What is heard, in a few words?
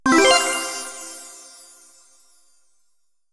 correct answer